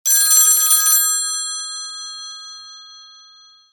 Old Telephone, ringtones; Coolpad 1827

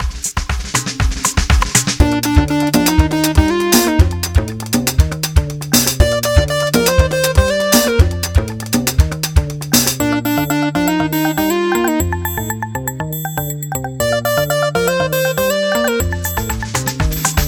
Latin, ringtones; LeEco; Le-2

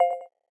Video Stop, ui; Vivo V5

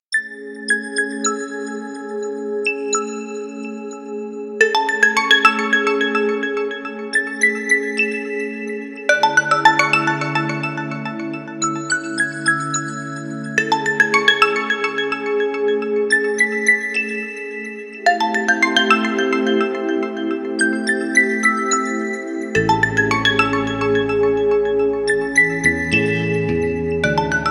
Tone; Coolpad

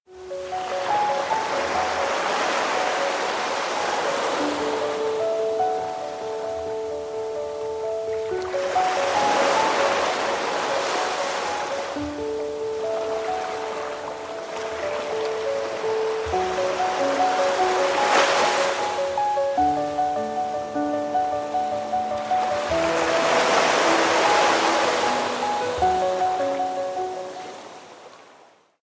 Sea, alarms

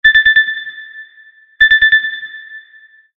sonar, notifications; Lenovo A390

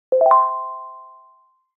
Wireless Charging Started; Gigaset GS290

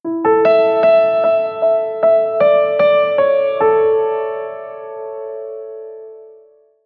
Gentle Piano; Gigaset GS290; ringtones

